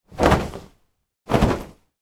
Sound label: foley blanket shake x2; zapsplat